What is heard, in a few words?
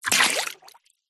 household water pour fast drop into metal bowl; zapsplat